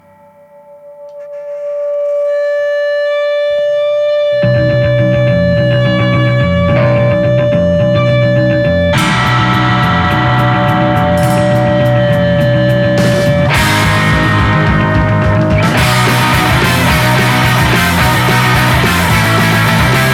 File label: electric guitar intro